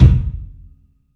KICK, 3-D Percussives; E-MU Producer Series Vol. 5 – 3-D Audio Collection; P.A. Kit

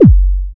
BD ANALOG; Sound & Vision - Gigapack II CD 2

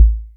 Sub, drum

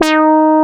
ROLAND JX3P, EB4; Big Fish Audio - First Call Vintage Keyboards

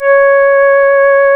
REED C#; Big Fish Audio; Synclav FM

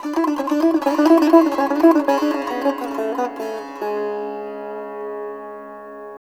SITAR; Spectrasonics; Heart of Asia

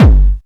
BD DIST3, Korg Electribe EMX-1

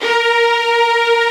ARCO STRGS M; OMI - Universe of Sounds

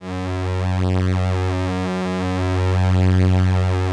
LAMBDA, String Machines; Best Service; XX Large Most Wanted 2

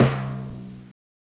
Timpani; M8; Genesis

